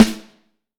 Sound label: Snare Drum